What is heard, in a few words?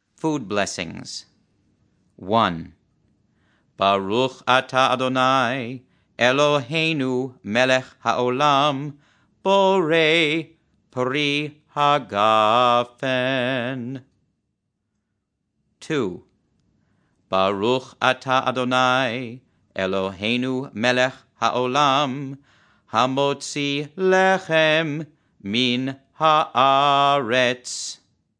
Food Blessings Chant; ramah4